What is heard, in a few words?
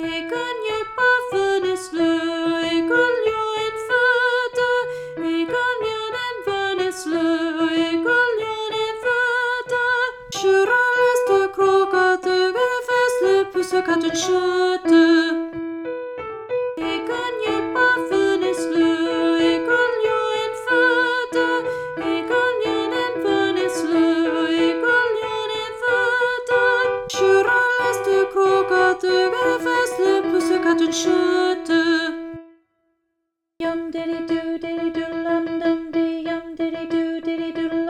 Norwegian Trilogy 3 Alto; elementary honor choir rehearsal tracks